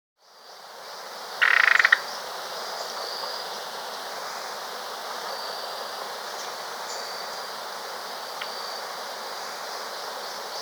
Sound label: Ochre-Faced Tody-Flycatcher (Todirostrum Plumbeiceps), Andean Birds; S. America